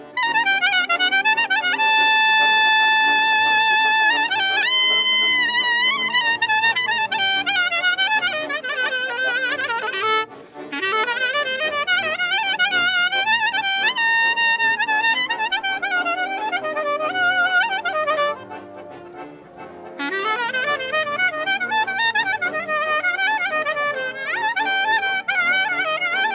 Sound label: solo clarinet